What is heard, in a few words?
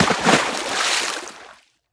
splash; Scrat